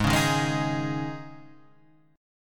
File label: G sharp Suspended 4th, chord; strummed; x,x,6,6,3,3,5,5